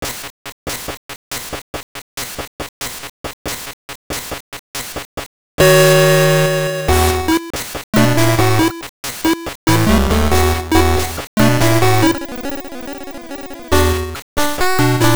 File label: BOSSA; beatmania GB